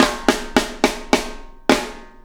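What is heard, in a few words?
CAT FILL left, CAT FIGHT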